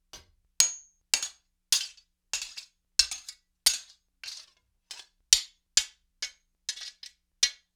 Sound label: Mace Norse Sword Blade, Weapon on Weapon; StillNorthMedia